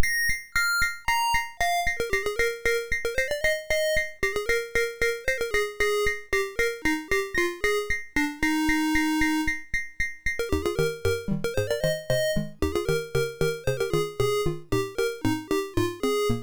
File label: Season Jingles, MOS6581R3